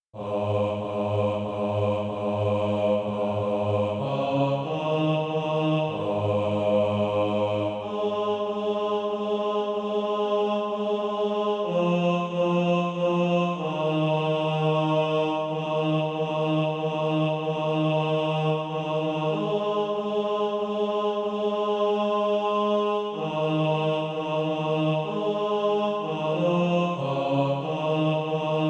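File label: bass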